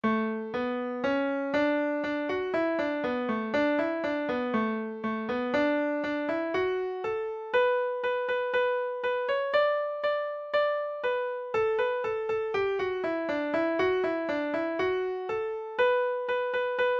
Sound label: shuffle, session tune midis; cherokee